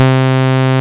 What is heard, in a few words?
ACID C3, Alpha Dance